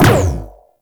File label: Tenet Quanta Alt Fire Projectile Bounce; Warframe; Corpus